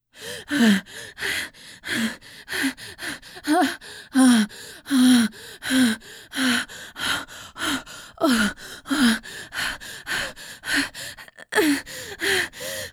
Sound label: Breathing - Fast and hard